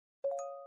linkedin notification tone

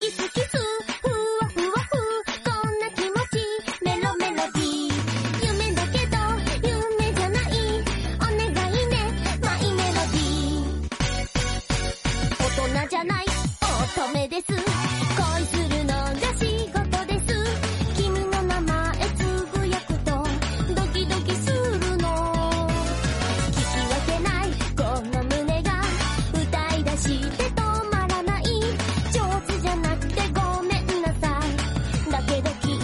comic japanese; onegai my melody

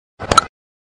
cricket bat tone hitting message sound

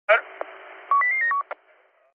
tamilnadu police walkie talkie radio sound

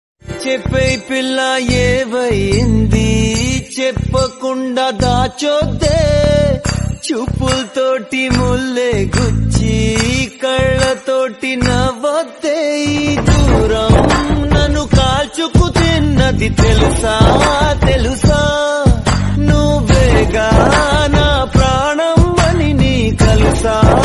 old telugu love songs

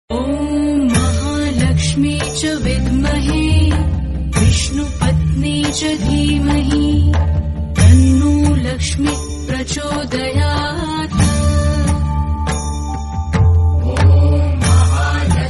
laxmi maa tune notification

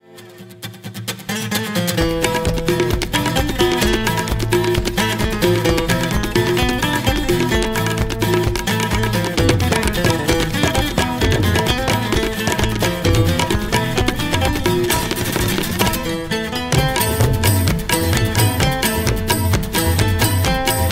Tabla

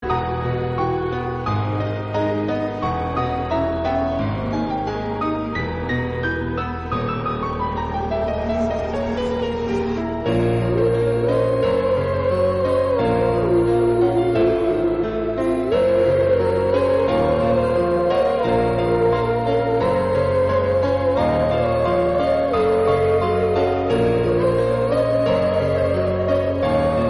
horror piano music

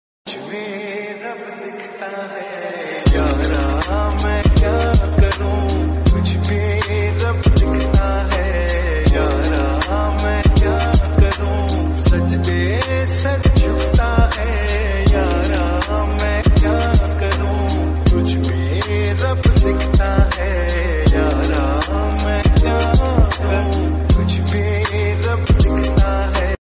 slowed reverb

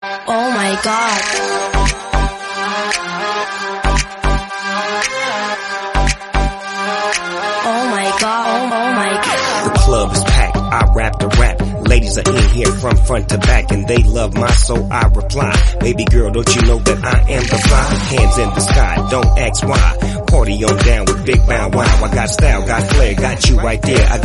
rap song

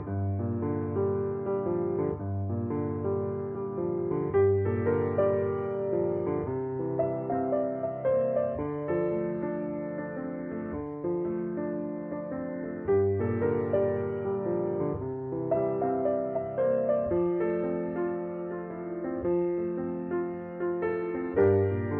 instrumental bgm

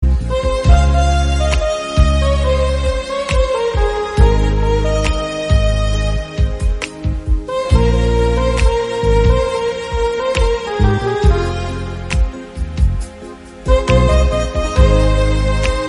instrumental

